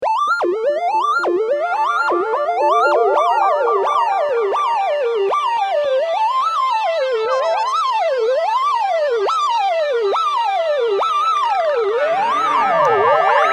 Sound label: Arp